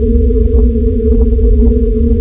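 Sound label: ambience air hum; cruisecontrol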